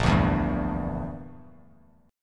Bb 6th Flat 5th Bb, Bass Standard; Bb6b5 strummed

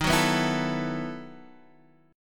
E Major 7th Suspended 2nd Suspended 4th

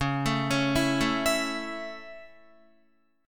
Db Minor Sharp 5th Db down Guitar; arpeggiated; Dbm#5; JGuitar

